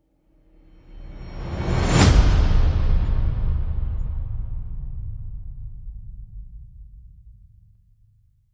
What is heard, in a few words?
Slammer, Risers; Gothic Storm Music